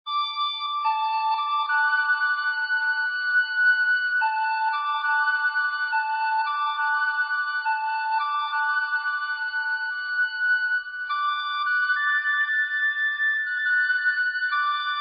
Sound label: Taps; Girl Scouts